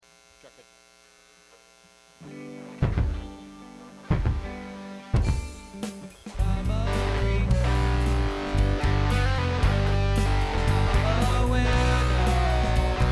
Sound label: Buzz and Feedback Mix